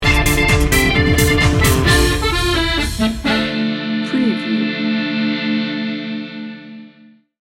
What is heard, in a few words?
latin pop dance sting bumper